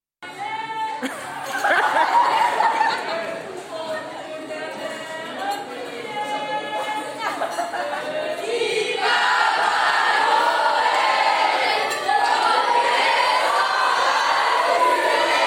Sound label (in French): Chant bien connu de Noël